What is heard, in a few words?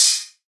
Open Hihat, Hip Hop Drums - One Shots Cymbals; Cymatics - 9God Hip Hop Sample Pack